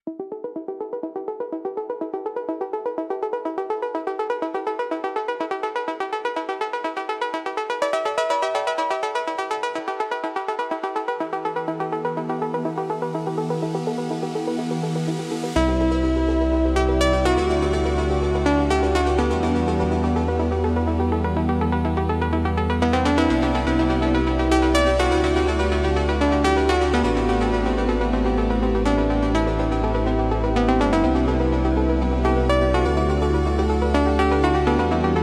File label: Electronic Impulse